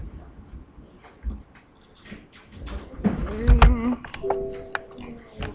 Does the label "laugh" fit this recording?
Yes